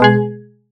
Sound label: strum reward